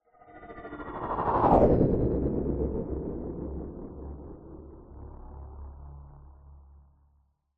science fiction hovering vehicle pass by fast

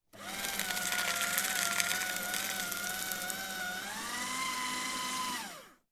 office paper shredder shreds document

